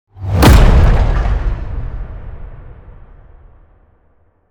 cinematic heavy hit

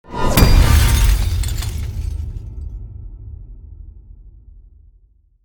cinematic glass hit

